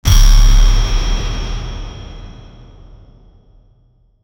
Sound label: monster hit sounds 16bit